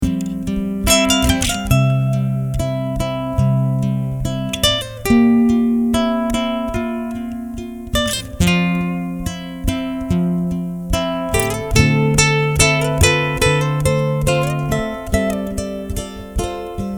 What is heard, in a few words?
a little ballet guitarlele music